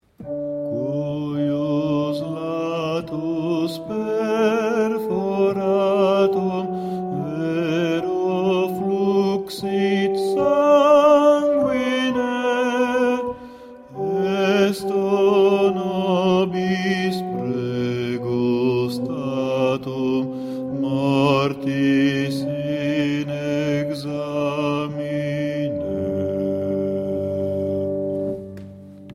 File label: Bass; Ave Verum corpus-E.Edgar